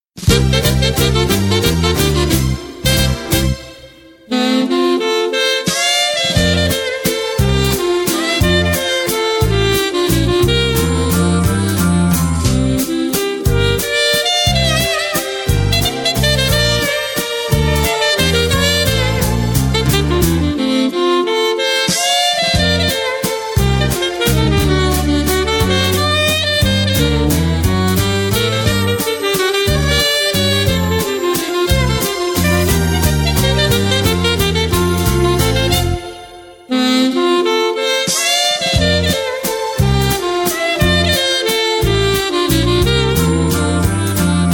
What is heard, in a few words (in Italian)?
sax per due